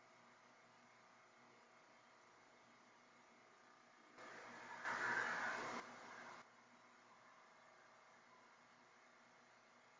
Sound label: Chapel Static; fairfield county infirmary return investigation